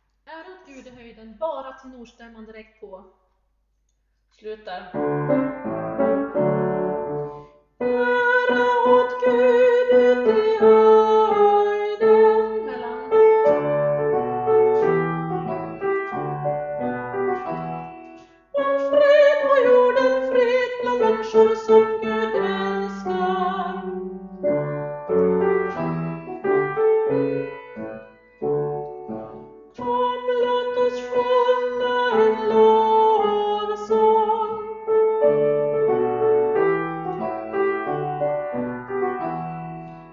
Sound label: tenor